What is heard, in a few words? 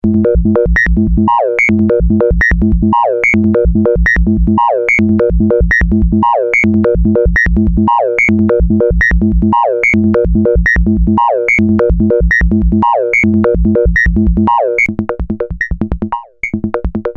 linexp, Dual VCA